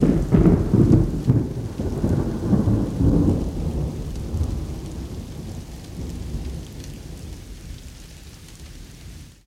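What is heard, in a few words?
Thunder Crack Distant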